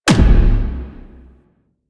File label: Archgun Punch; Warframe; Archwing